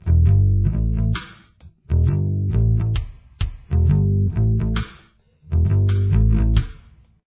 bass

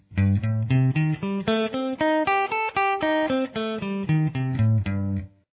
Arpeggio Am style, guitar

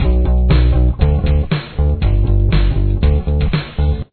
pre-chorus bass, just the bass part; drop D tuning

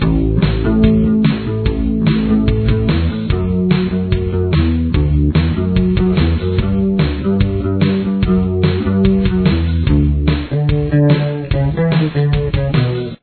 verse bass

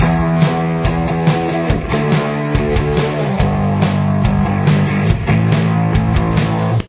chorus guitar

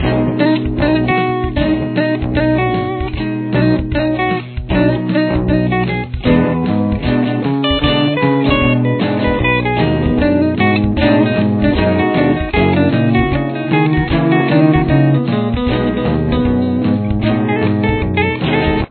with lead, guitar

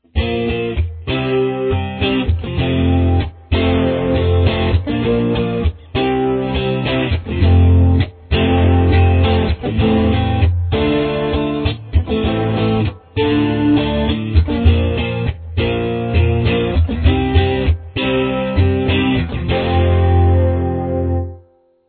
F major, guitar

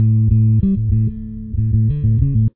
bass intro

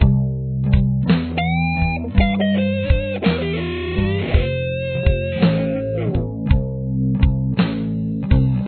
lick, guitar

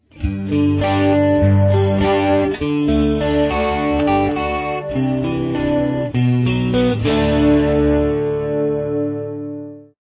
G major, guitar

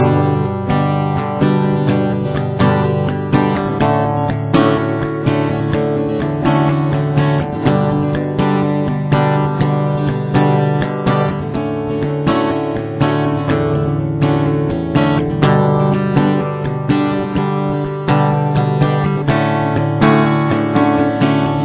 chorus, guitar

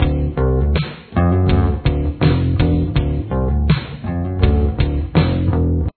bass

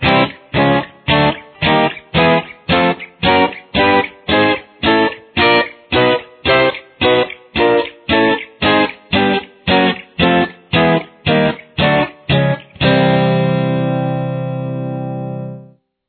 C major barred exercise, guitar

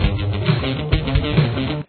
interlude

